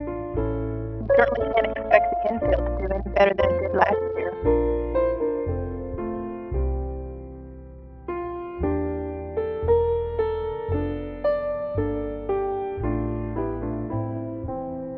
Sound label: mus